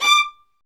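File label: strings violins; L-CD702; Vlns Marc4-5; Vls Marc4 x8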